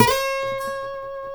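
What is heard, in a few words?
D 5A MARTIN SLIDE, Guitars; MARTIN 6; Spectrasonics; Hans Zimmer Guitars VOL-1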